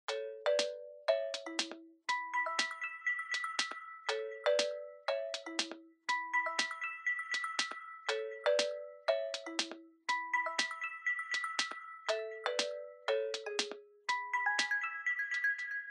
Smartphone Ringtone Speaker

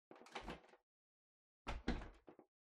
Air Purifier (Far Put Down)

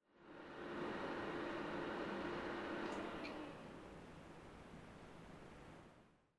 PC Operating Noise Far Forced Shutdown

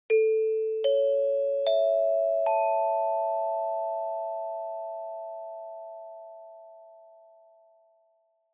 Chime Announce 4 Tone Slow Up

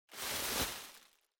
Packaging Air Cushion (Rustle); 梱包用エアークッション; ガサガサ; 梱包材

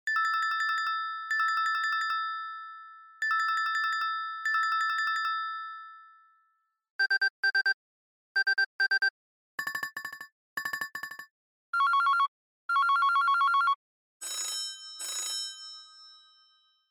Smartphone Ringtone

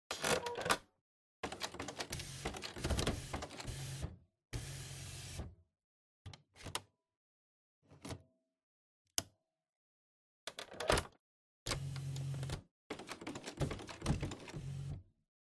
Printer Ink Replace

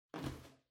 Cardboard Box Far Pick Up; 梱包材; ダンボール; 遠い 持つ

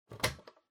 Power Strip Gentle Unplug

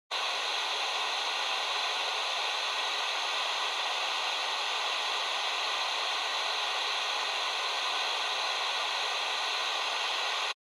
Radio Signal Noise FM

